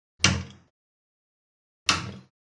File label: gas lighter; فندک گازی